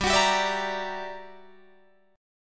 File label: G Minor Major 13th, G, Guitar, DADGAD